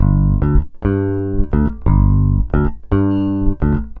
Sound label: SA BS G6, BASS SALSA